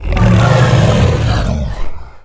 Thunder Lizard Death

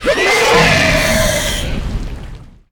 Firehawk Form Death

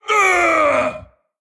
Male Tol'vir Death

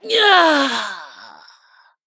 Female Tortollan Death

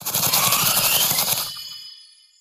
Glitter Moth Attack

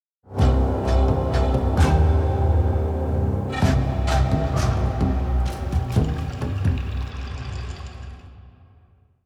Play Stinger

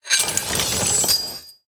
Handcuffs Underlay Play

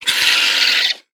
Gargoyle Attack; Gilded Gargoyle

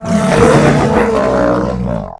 Clefthoof Death

